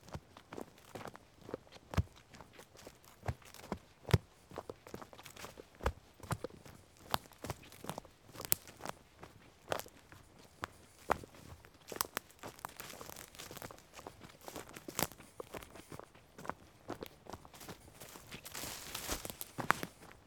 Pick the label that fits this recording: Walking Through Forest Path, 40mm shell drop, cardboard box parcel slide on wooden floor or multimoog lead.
Walking Through Forest Path